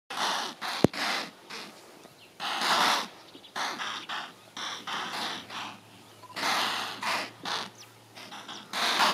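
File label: short alarm signal of impala; zvuki antilopyi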